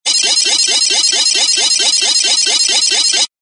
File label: comm telemetry malfunction